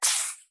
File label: Rattle Snake